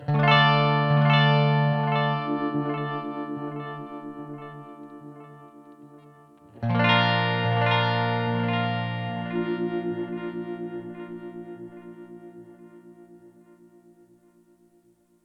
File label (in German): TC Electronic 2290 P Delay mit Reverse dynamischem Pan